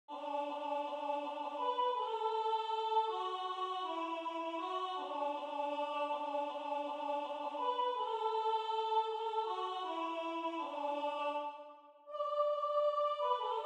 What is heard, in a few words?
Soprano